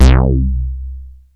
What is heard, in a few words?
synt, Juno-2; Giga Samples Collection; Bass